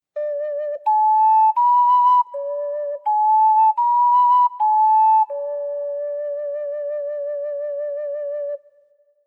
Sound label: (Ocarina)